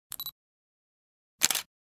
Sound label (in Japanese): kamera